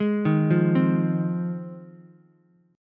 G sharp 6th, Ukulele; arpeggiated; 1,3,1,3; JGuitar

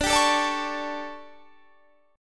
D Major 7th Suspended 4th, Guitar, Standard; DM7sus4; strummed; JGuitar

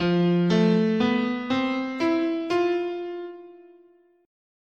F Chinese, scale

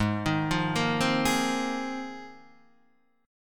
Ab 6th Add 9th Ab, Guitar, DADGAD

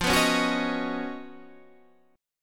Db 11th F, guitar

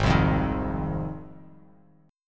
Ab 6th down Bass Standard; strummed; JGuitar

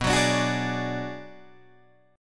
B Minor 6th B Guitar Drop D, chord

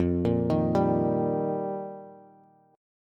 F 7th Suspended 2nd Suspended 4th, Bass; F7sus2sus4; arpeggiated; JGuitar

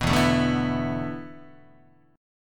E 7th Suspended 4th, Guitar; E7sus4; strummed

